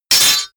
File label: sword parry metal